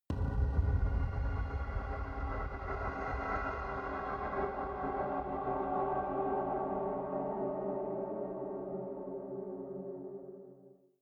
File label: sub atmospheric evil, horror